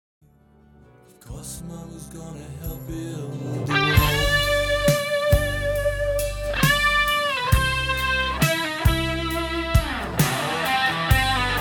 Solos Isolated center channel; center channel 5.1 mix

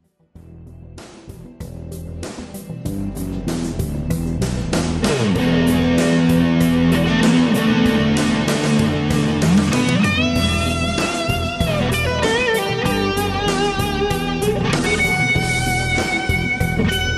solo

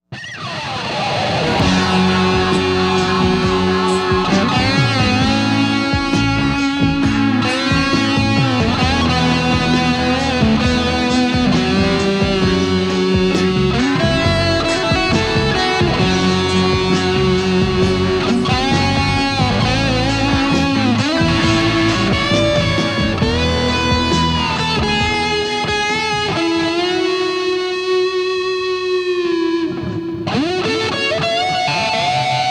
Solo Cornish P-2; SSL5

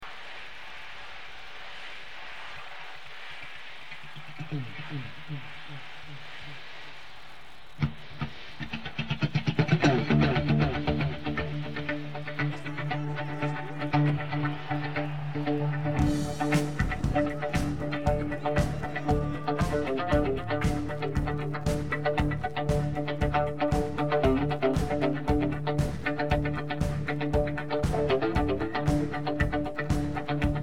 R channel; long version extract

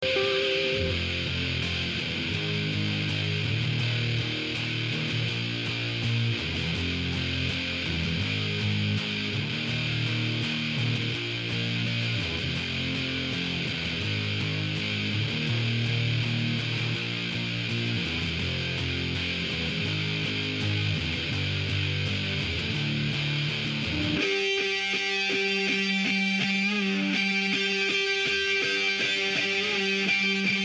L R Isolated Guitar Tracks

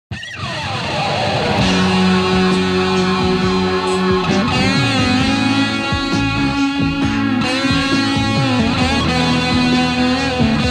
Solo, EHX Green Russian; SSL5

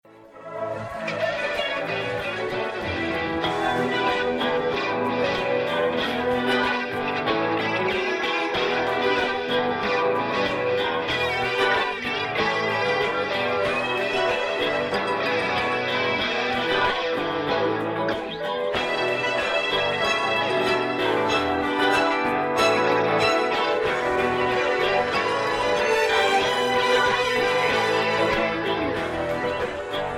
modulated guitar solo

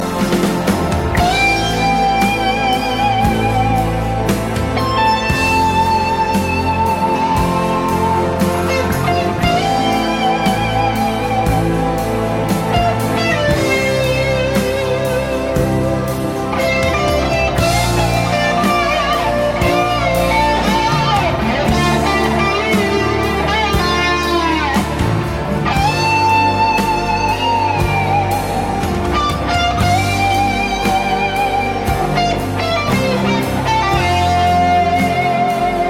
solo

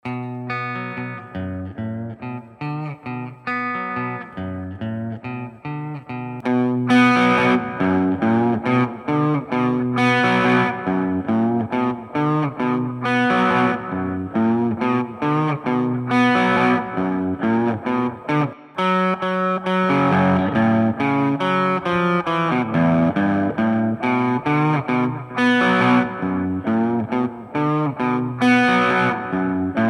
Colorsound Light Boost

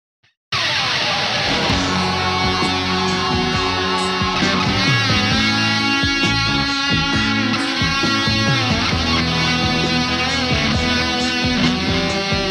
Solo fuzz face; SSL5; Reeves Eclps

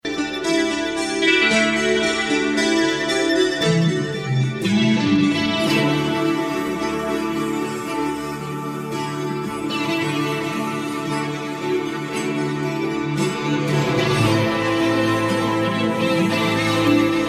modulated guitar solo

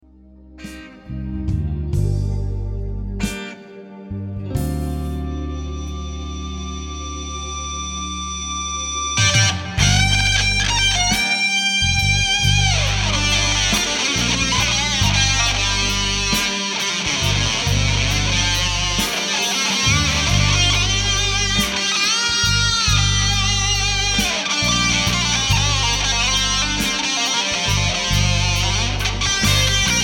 Colorsound Power Boost Big Muff combo tone

